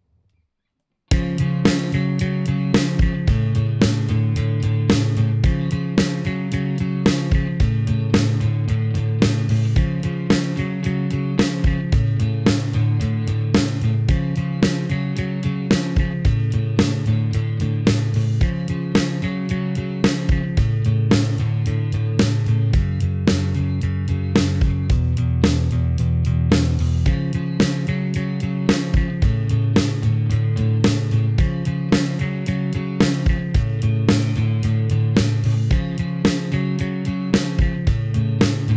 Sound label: AT4047 with song